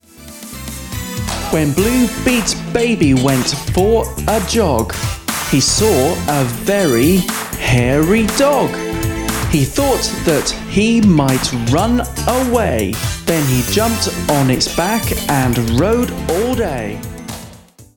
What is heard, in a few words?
rap